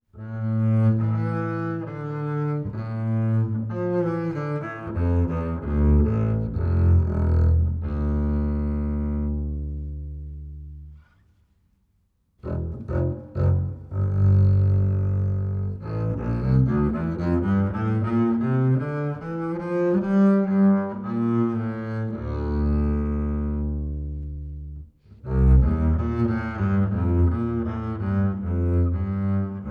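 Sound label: acoustic bass Bowed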